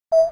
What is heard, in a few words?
crossbills alarm